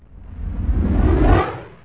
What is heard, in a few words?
Flashback